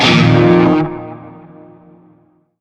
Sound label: Shawzin Pent Min Chord C; Warframe; Narmer